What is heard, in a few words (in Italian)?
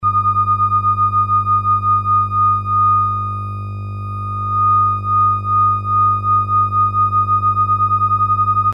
battimento; Elettronica